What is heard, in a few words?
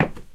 wood step; Golden Crayon Sounds